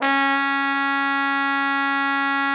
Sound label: TROMBONE, SECTION LOOP; Best Service; Killer Horns